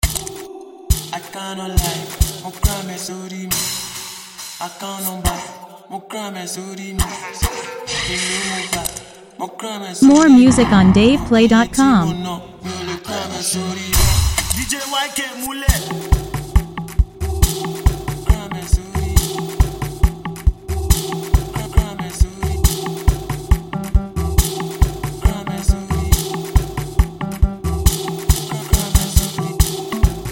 Dance Version

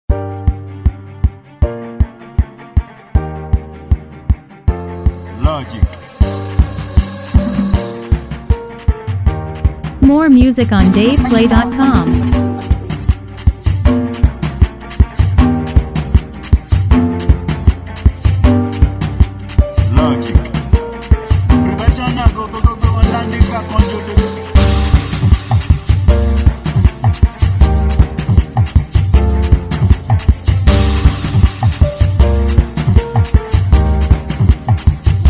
Beat

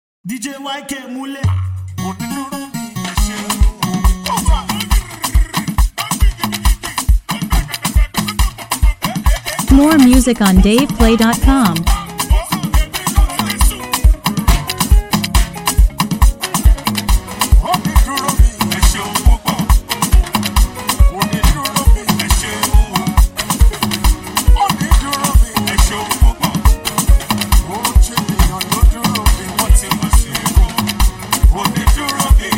Dance Version

